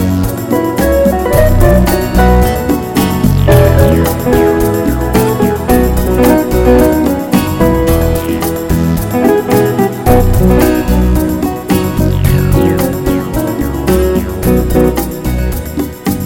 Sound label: Wonderful Bolero